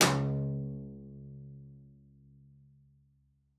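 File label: CELLO spiccato C#2; 90 s Sample CDs; Xsample VOL-5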